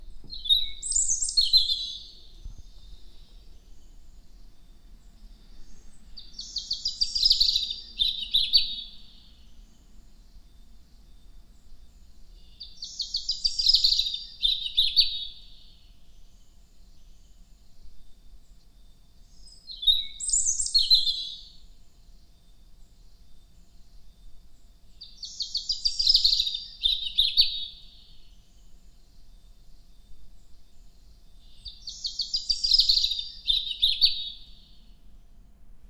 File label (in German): Rotkehlchen